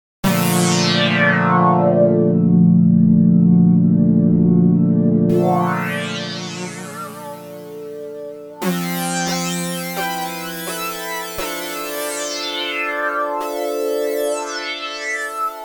Objeq Delay

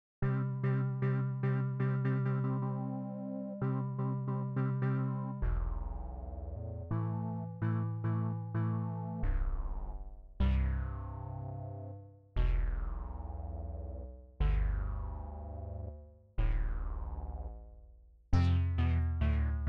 Noisy

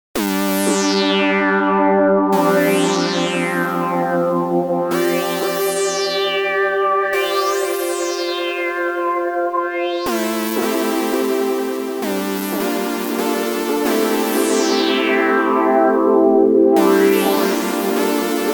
Objeq Delay

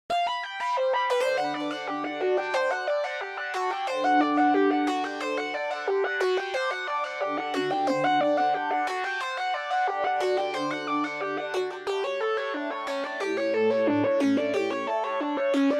Modular V

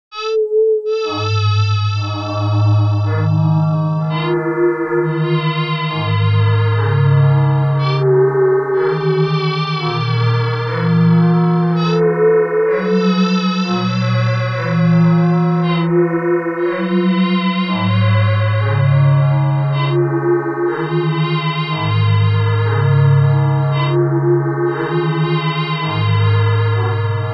Modular

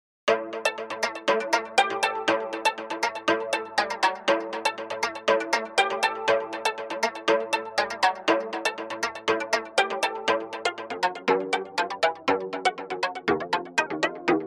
World Instruments

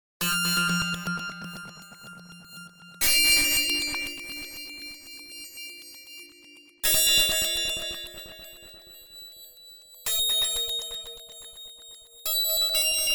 ABSYNTH

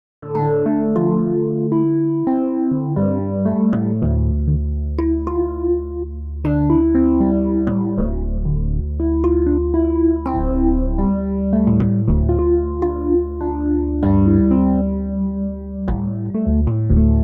Key Suite Electric

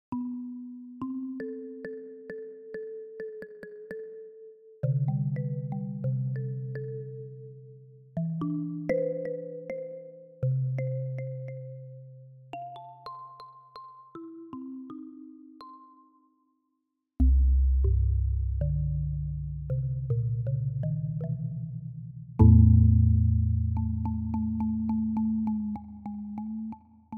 Chromaphone

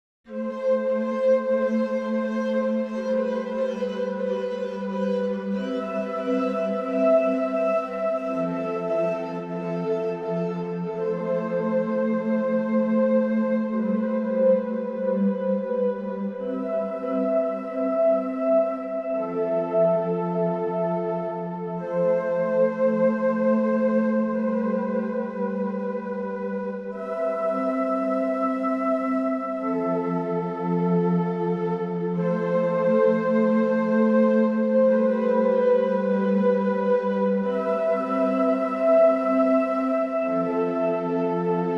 World Instruments

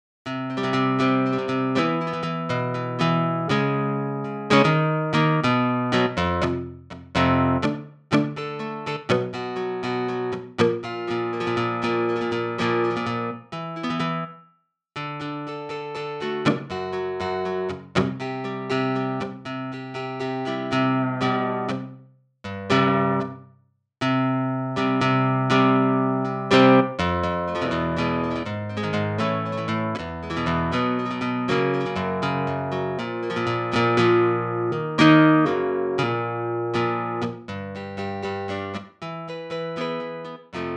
Strum GS

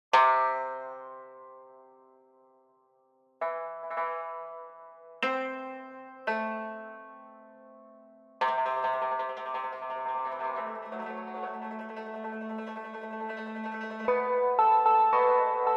Yangqin

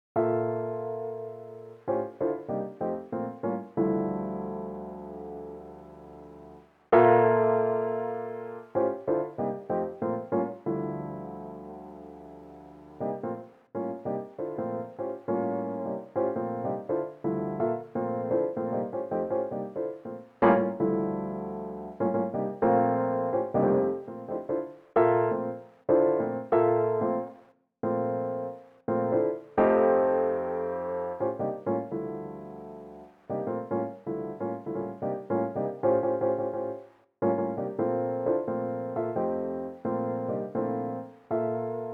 Lo Fi Piano

